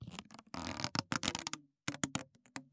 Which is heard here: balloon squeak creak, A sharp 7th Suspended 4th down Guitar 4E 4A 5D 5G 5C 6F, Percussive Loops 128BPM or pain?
balloon squeak creak